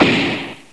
Blow; pokerogue; battle anims

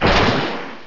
Wood Hammer; battle anims; pokerogue

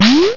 Power Up Punch; pokerogue; battle anims

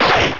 Secret Sword; pokerogue; battle anims

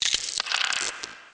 egg crack; pokerogue

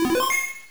save; pokerogue

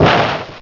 Dream Eater; pokerogue; battle anims

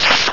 False Swipe; pokerogue; battle anims

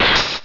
Horn Leech; pokerogue; battle anims

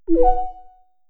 message, achievements; duckstation

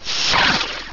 Grass Pledge; pokerogue; battle anims

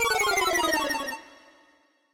decrease; pokerogue; battle anims

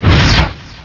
Dual Chop; pokerogue; battle anims

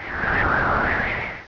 Powder Snow; pokerogue; battle anims

